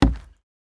footsteps wood

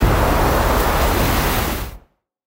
water magic middle, magic spell; 魔法/法术的音效

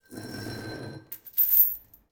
Footsteps Chain

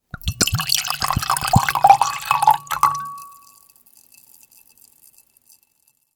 Pouring wine into glass

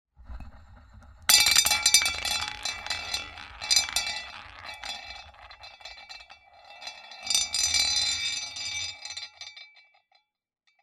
The roulette wheel spins double; board game casino mahjong

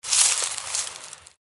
dry leaves footstep; 足音

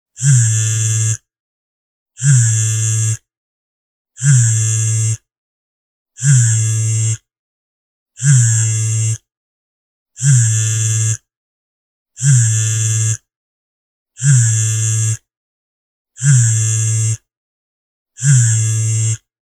mobile ringtone phone vibration

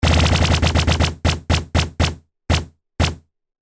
consecutive impact, mahjong; ボードゲームやカジノ、麻雀の効果音です。

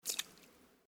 Footsteps Puddle One

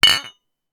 Bottle beer cheers; lifestyle

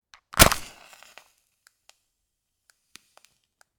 Strike a match

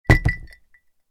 Put down the glass with ice

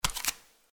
Footsteps Barefoot One

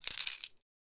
coin sound; game inn

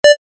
cursor

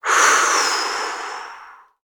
Breath

